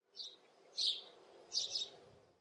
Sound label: chirp, wildlife; matmos hl